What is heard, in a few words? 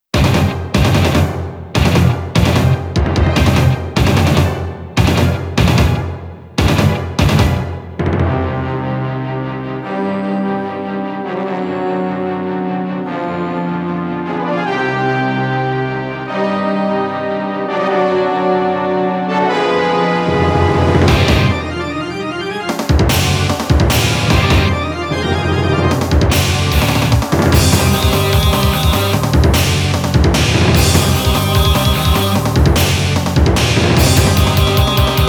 instrumental